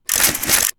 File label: Sci Fi Weapon Reloading